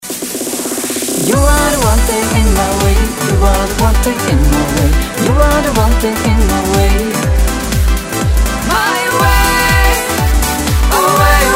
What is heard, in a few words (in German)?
plus Sax und Geige